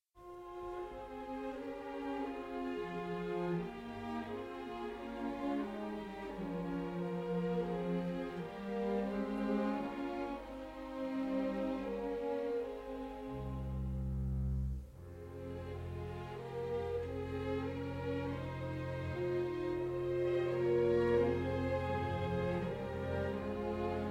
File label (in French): Concerto pour piano et orchestre Andante